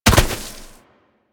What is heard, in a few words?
Bird Of Prey Bodyfall Grass; Warframe; Hunting Critters